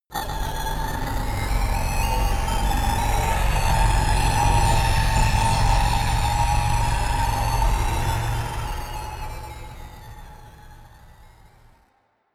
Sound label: danger rumble, horror